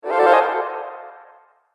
Amazing Magic Casting

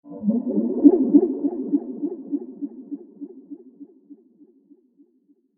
Diffuse Science Fiction UFO Spaceship Transition

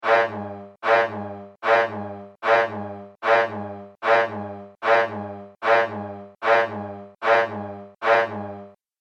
Laser Machine Pulse; Laser Sound

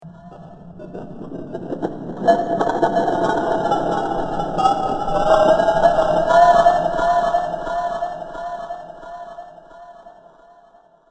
Creepy Ambience Metal Chimes; Evil Sound Effects; horror sound effect

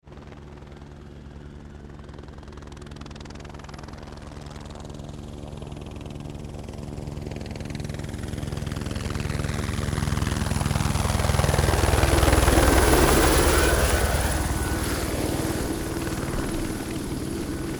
Helicopter Close Fly By; A helicopter fly by, pass by.; Aircraft sounds; Helicopter Sound Effects

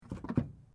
Car van old door open; various vehicle sounds; Cars; SoundEffects-Foundation1

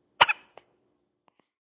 Squeak Baby Hiccup; Baby Squeak Hiccup 1; Baby sound; Hiccupping; Human

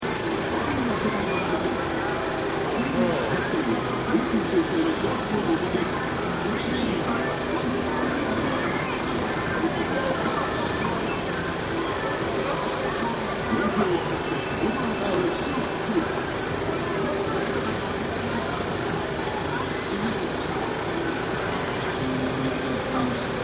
Street Ambience Tokyo; Cities; Backgrounds

